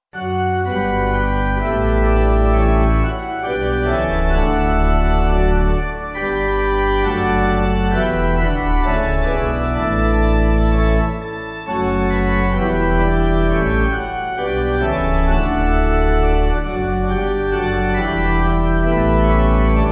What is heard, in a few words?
Pipe